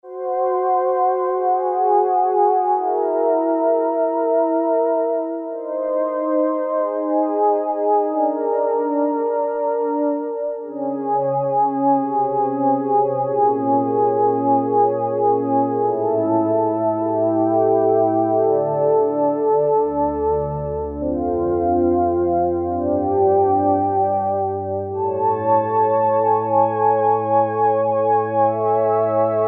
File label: Choir, Roland Juno-106; Factory Preset